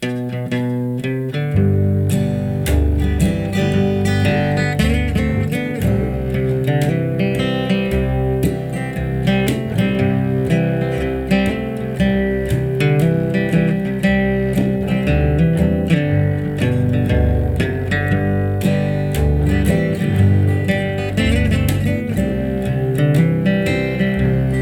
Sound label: Double Bass No EQ